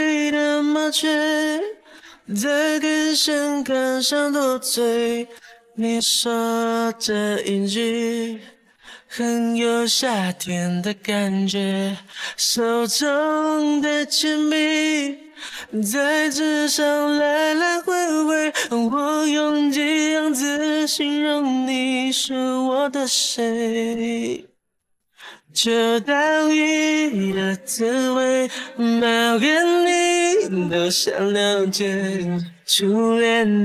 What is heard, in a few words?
vocal; E2-F5-TTS